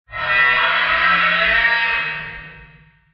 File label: wendigo calm